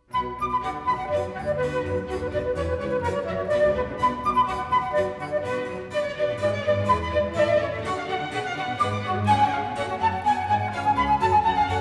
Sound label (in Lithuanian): siuita orkestrui ir solo fleitai h-moll; J. S. Bach; Pokstas